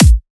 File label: Kick Dubstep